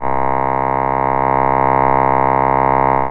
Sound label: Ground, Industrial